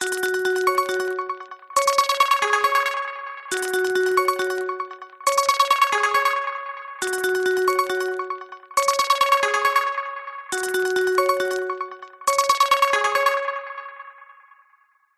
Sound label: multimedia voip call ring software; zapsplat